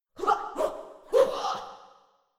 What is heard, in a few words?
Princess Attack VOX